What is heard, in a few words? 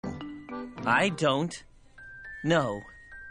hahahaha